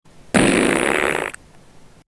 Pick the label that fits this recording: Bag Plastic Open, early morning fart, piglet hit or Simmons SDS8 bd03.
early morning fart